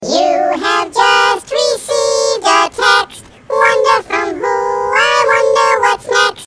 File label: chipmunk text; animals